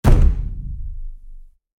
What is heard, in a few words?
RC Ball Hit; Scraprun; Deathrun